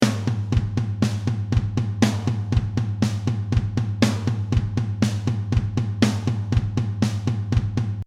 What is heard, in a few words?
toms